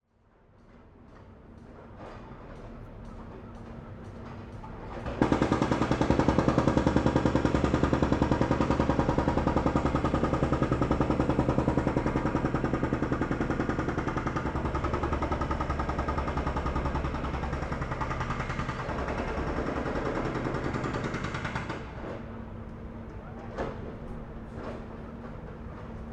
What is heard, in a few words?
Construction Site Street Hydraulic Jackhammer Middle Distance; Construction Ambiences